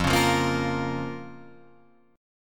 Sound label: F Suspended 4th Sharp 5th F down Guitar Open F; Fsus4#5 strummed; JGuitar